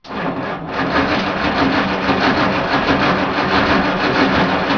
nautical; GRSITES Graphics

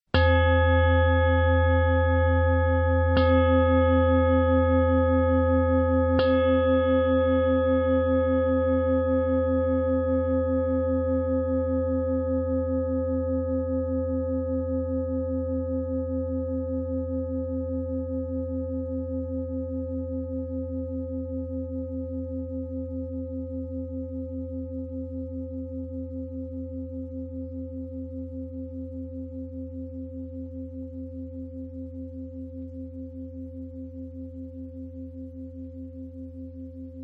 3 quick gong ending; MEDITATION

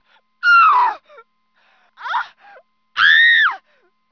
people; GRSITES Graphics

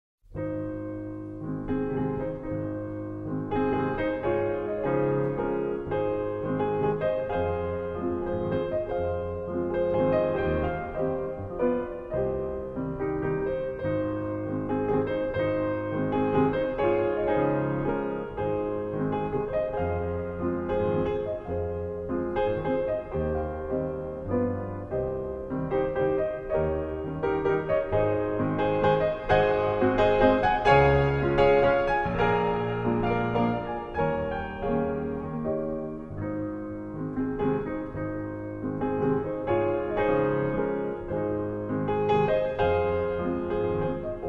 Waltz In A Flat Major Op.39 No.15, PIANO; Masters Of The Roll, Vol. 26; Brahms

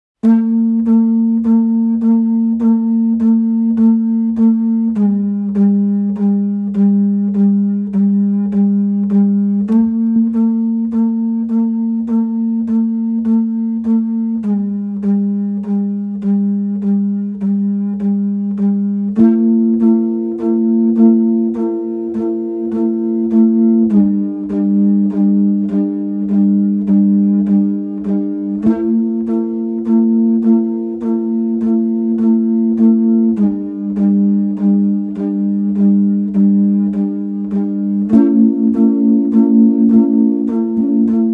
Morning Ambience, Live Didgeridoo

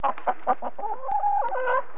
farm; GRSITES Graphics

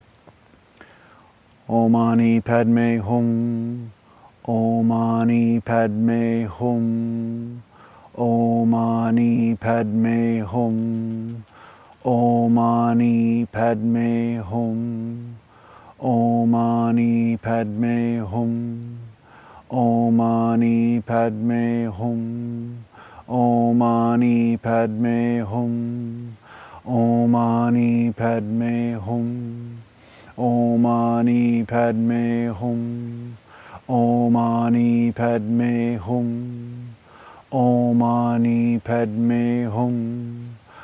Om Mani Padme Hum (108 times)

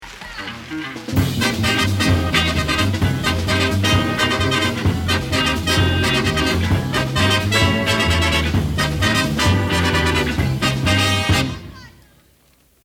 Instrumental Bridge

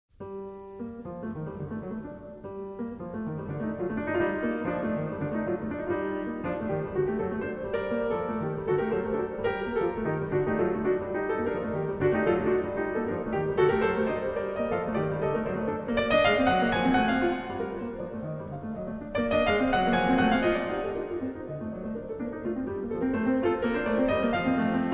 Spanish Ryhthms Solea; Masters of the Roll, Vol. 32; Raoul Laparra

